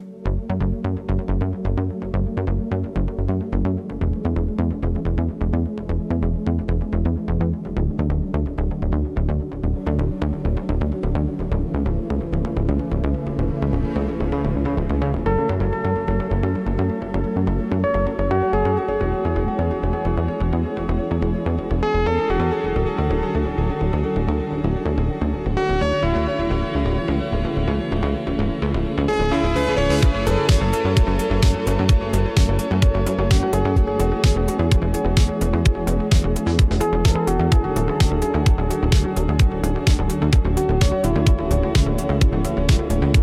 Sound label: Electronic Impulse